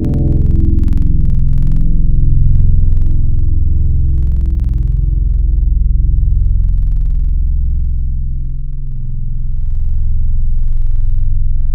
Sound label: Lofi Organ; Synthetic's Serum Collection; Surge Sounds - ASTRO - Serum Wavetables